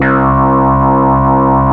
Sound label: ODY, ARP Odyssey